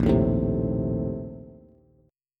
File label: Bb Major 7th Suspended 2nd Suspended 4th, Bass; strummed